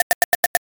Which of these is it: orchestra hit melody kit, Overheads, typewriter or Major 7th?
typewriter